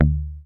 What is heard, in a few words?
HOFNER MUTE, Bass